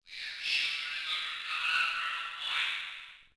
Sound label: subway